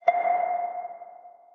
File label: chime